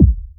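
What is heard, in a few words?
kick, Boss DR-202; Drums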